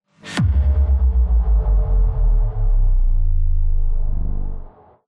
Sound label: Giant Floor Panel Lower Rotate LFE; Boomtown; Sideways Town; Titanfall 2